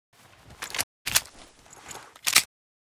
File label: pm reload, weapons